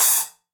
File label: Cymbal A Stick Stop; Latin Percussion; Drums; Drum Hits; Ableton; Factory Packs